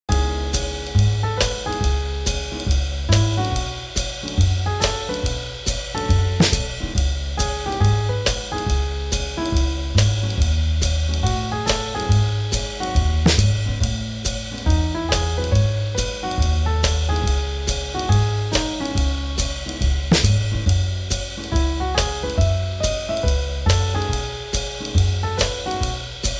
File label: Swing Jazz